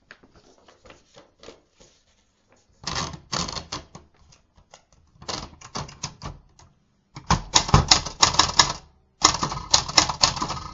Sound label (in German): olympia reiseschreibmaschine